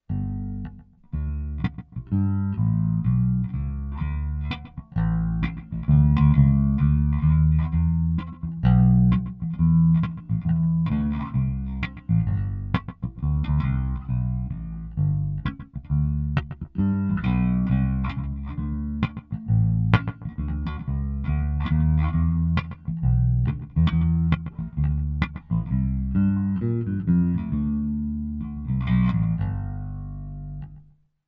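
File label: SWEET BOTTOM, Zoom 506 II bass effects factory preset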